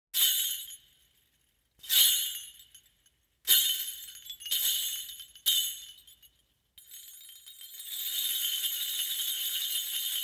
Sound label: Kagurasuzu